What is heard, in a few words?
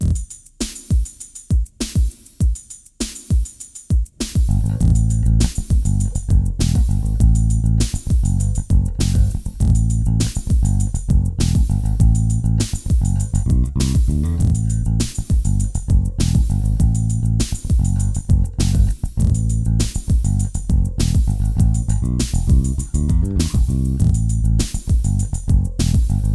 Groove